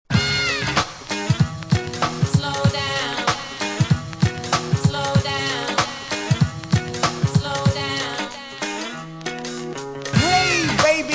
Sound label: intro rap refrain